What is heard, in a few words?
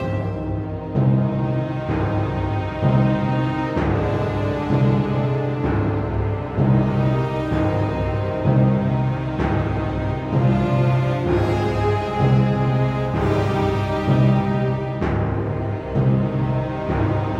Music Segments Walk Big Cold 64bpm Fadein Loop; asterix obelix slap them all 2